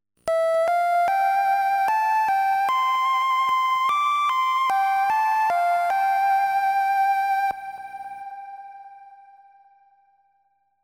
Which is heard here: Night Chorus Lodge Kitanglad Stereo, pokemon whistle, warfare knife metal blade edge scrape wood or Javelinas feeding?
pokemon whistle